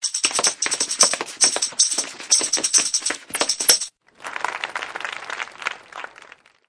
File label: bamboo cane